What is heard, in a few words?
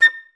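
flutes staccato A5; Best Service; Peter Siedlaczek's Advanced Orchestra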